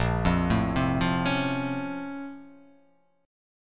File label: A sharp Minor Major 9th, down